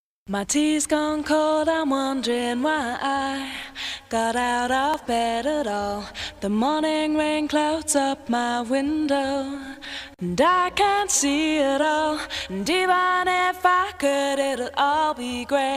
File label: acapella studio